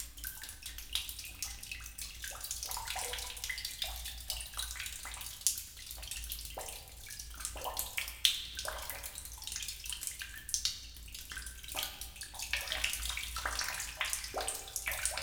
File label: cave drips loop; Hydrology